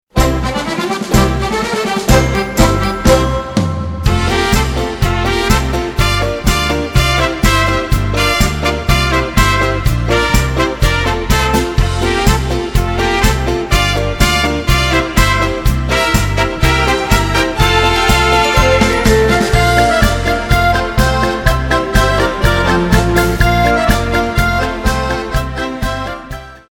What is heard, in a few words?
Kaizer Polka